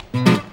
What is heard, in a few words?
STL STRN BRK; Best Service; World Colours